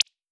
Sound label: cute pop